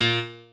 piano

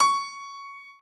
piano

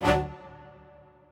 strings

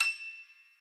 piano

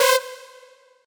synth

